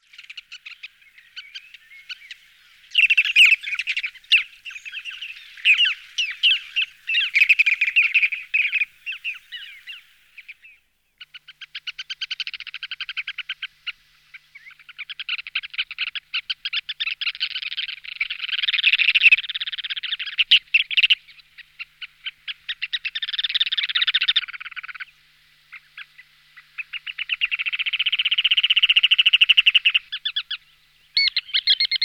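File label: Arenaria interpres, charadriiformes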